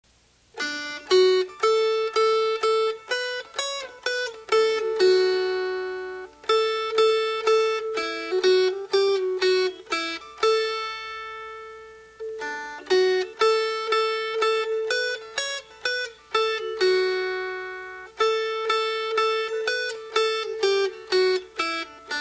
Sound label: Melody; Little Tree Toad; El Coquito